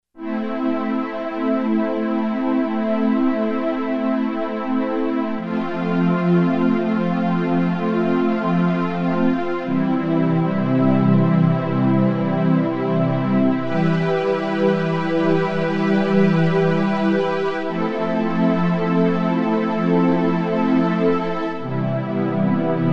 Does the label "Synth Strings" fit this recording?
Yes